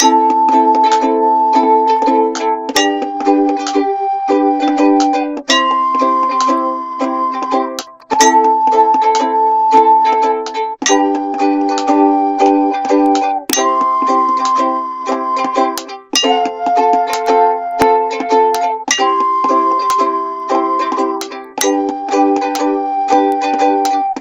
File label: uke and oca; weeklybeats-2014